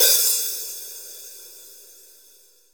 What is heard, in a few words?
HH OP, drums; DRY KIT; Real Mega Drums